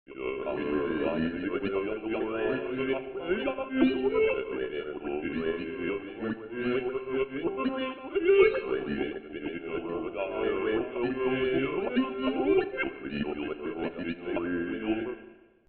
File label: fof vowel morph; csound massage